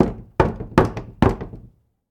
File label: doorknock; anemoi